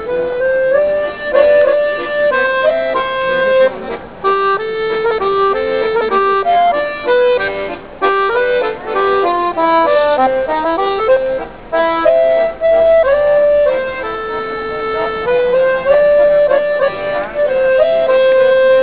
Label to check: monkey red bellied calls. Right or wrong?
Wrong